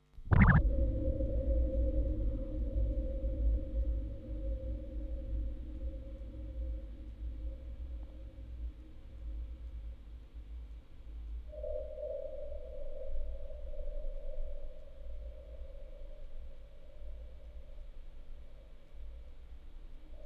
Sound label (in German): Aufnahme Tape out, Spieluhren-Quartett mit Tonband; Fieldrecording